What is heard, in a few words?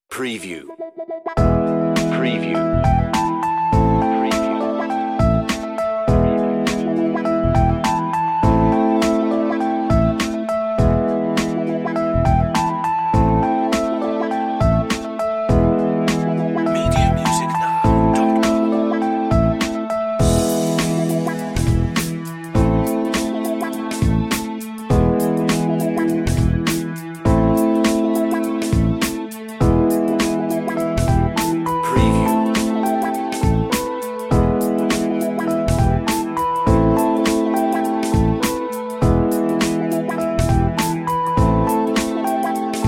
Chill Pill, on-hold music